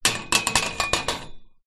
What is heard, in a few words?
small metal pole scrape amongst fixed metal poles; foley